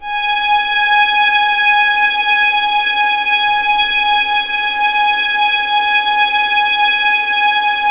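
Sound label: G#5 legato violins; East West; The Ultimate String Collection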